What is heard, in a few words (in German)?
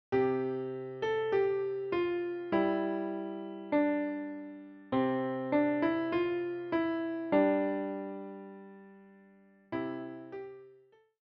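Klavier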